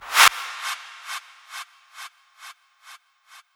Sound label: VFX Short Fills & Reverse